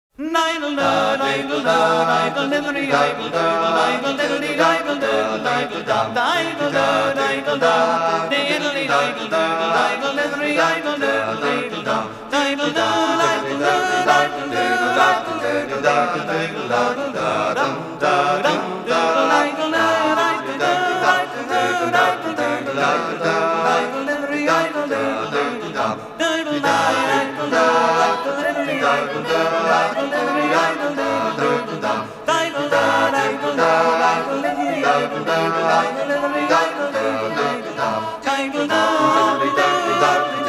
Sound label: Hornpipes